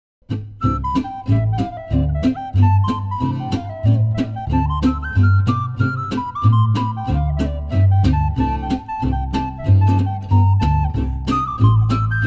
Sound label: swing